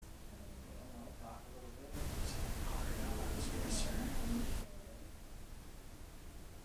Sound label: Recorder Amped